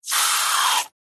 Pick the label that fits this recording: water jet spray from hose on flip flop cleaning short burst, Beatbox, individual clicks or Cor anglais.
water jet spray from hose on flip flop cleaning short burst